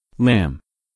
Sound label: lamb